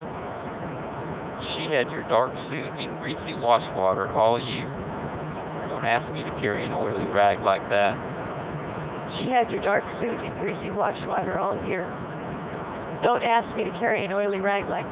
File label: car, noise